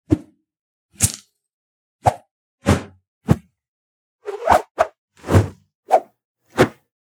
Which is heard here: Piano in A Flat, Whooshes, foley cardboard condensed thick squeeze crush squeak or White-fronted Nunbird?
Whooshes